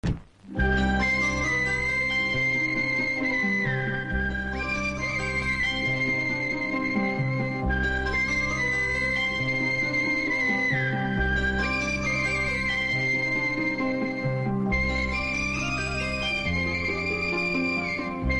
serial bgm; chinna thambi

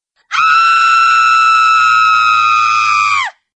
aaaaaa funny notification

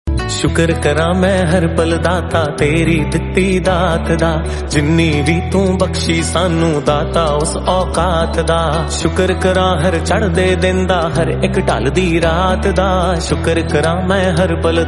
devotional

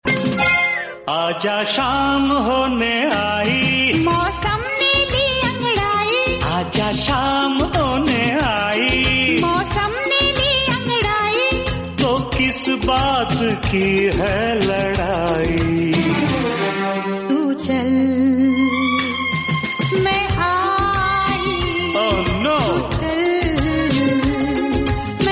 hindi old song

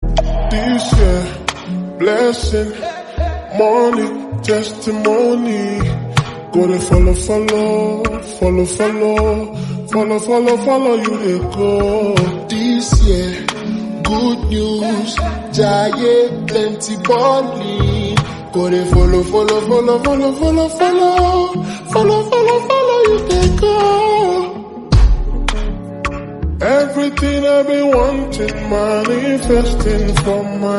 slow English song

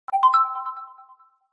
notification tone; realme c3

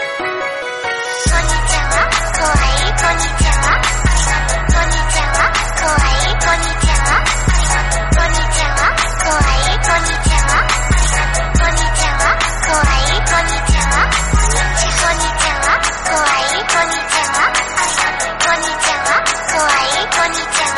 kawaii party

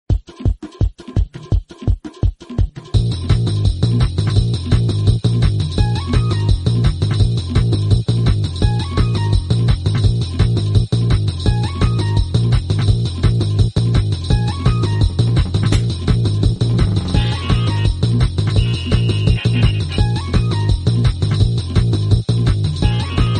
trance for running game; Dream Speedrun; running music

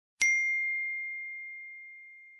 hand bell sound effect notification

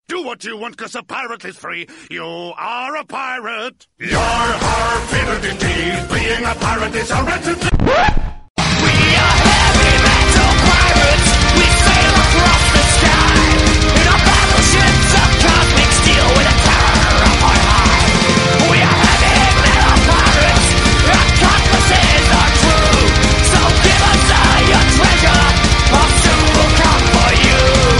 heavy metal

